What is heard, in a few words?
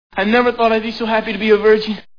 scream